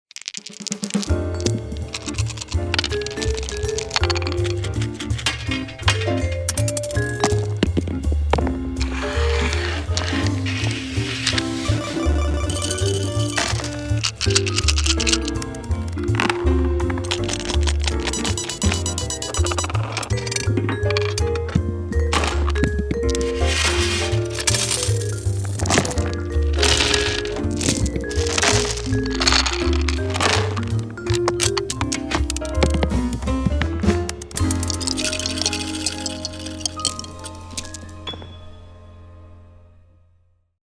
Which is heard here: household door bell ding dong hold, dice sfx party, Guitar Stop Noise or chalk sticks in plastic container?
dice sfx party